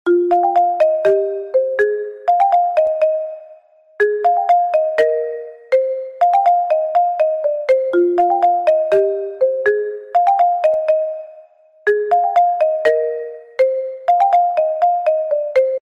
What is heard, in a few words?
cute background music ringtone